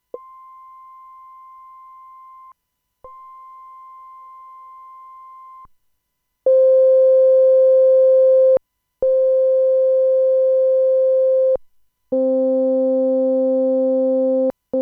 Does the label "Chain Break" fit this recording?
No